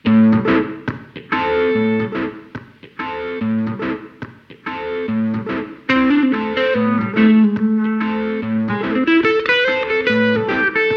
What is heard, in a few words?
delay hold